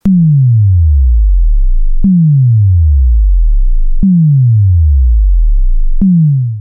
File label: bass